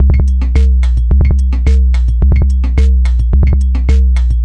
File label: dubby and deep kicks lofi snares, 108 bpm; 101 to 110 bpm